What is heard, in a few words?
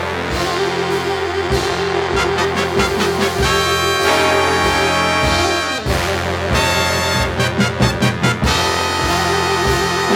Gentle Forest Jazz